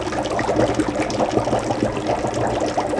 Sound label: short rain